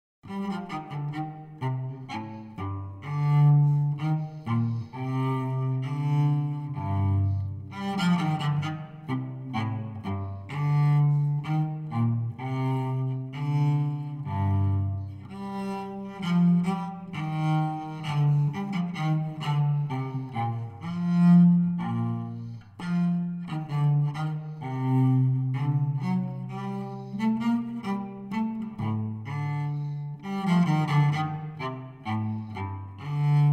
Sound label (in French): baroque violoncelle; airs sérieux et à boire